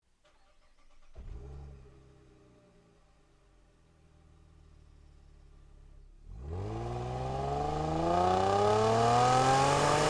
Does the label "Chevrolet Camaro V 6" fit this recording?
Yes